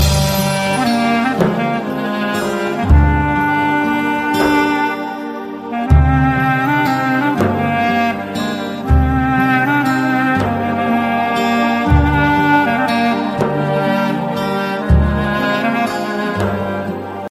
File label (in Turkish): Klarnet